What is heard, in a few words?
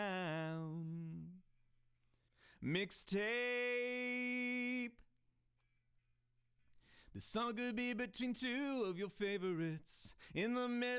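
with drums live rec 250ms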